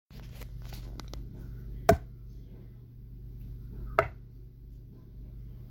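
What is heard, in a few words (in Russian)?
удар крышки мусорного бака об ограждение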